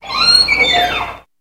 Door Metallic Squea, push bar

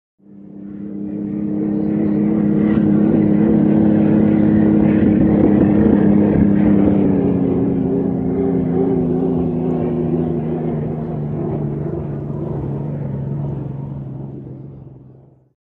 Hydroplane By Light

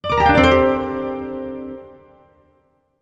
Music Effect Synthe, guitar

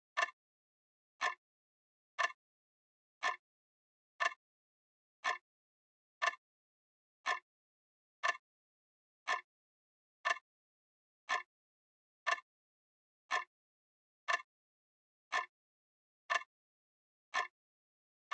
Grandfather Clock Ti

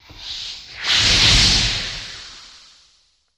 Fireball Flames

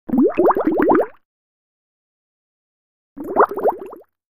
Bubbles Rapid Bubbl

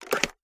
phone handset lift

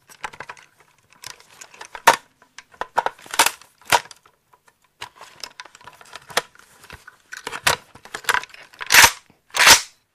Gun Moves Then Lock, handgun mvmt

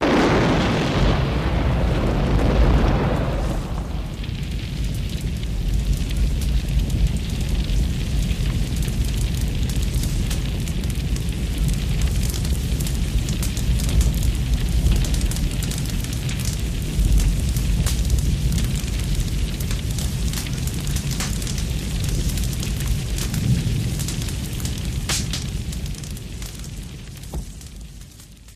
Napalm Blast Fire Ro, fireballs

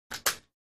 Clip Into Gun Handle

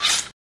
Knife Shing Sharpen; knives

